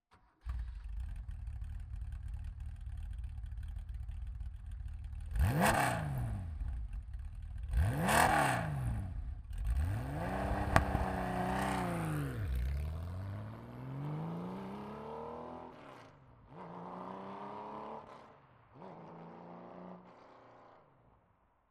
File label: porsche 911 rsr